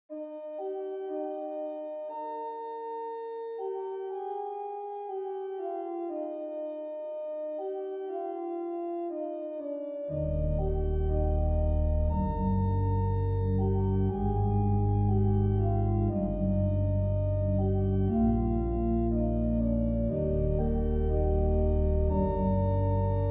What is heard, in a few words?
children chime, organ; Pekela; Carillon for organ, easy to play. Suitable for single manual and 13 keys pedalboard.